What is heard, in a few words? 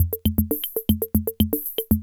Loop, classic machines, rhythm77